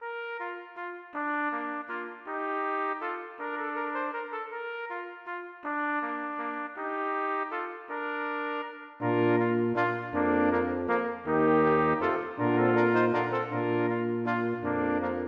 Menuet for messingkvintett; Aamodt

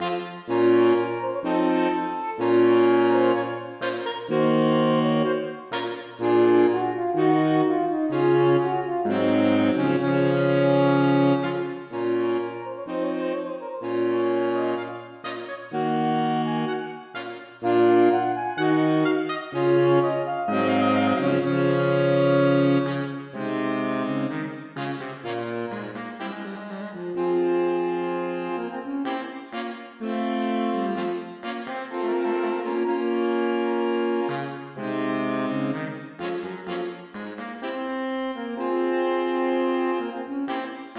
Galiard for saksofonkvartett; Aamodt

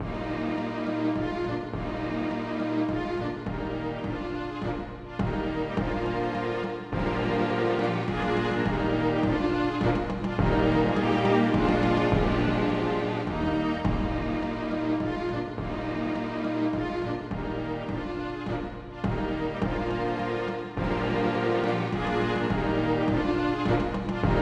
Menuett for strykekvintett med trommer; Johannesen

